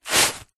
household cleaning wiping carpet with dry tissue paper single wipe; zapsplat